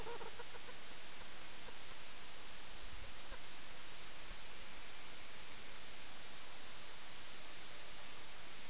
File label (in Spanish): cigarra; condominio